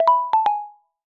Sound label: Grainy, notifications; Honor; X9c